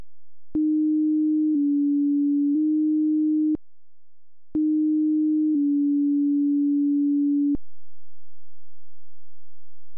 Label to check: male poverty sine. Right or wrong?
Right